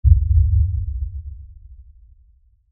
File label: Impact Lfe Rumble; Low Impacts (Sound Effects:Impacts); Blastwave